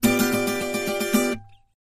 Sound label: Acoustic Guitar High 7th; guitars